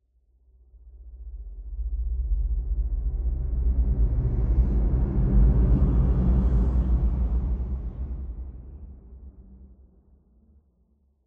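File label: ambience lobby; Half Life Alyx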